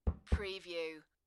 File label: Tapping on the Bottom of a Plastic Bucket; beanstalk audio